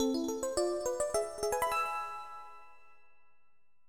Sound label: Jingles